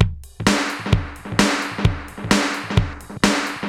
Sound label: Space Echo HR-16B 130BPM